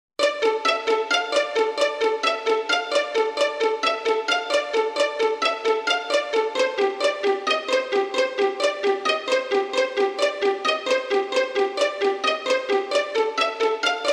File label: Pizzicato; Pizzicato Tracks